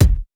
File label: Hiphop kick